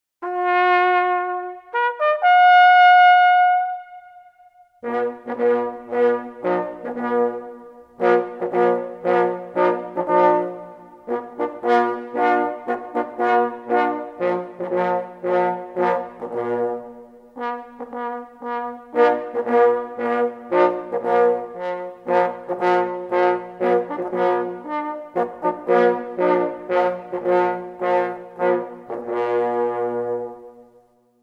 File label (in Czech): pocatek honu, troubeni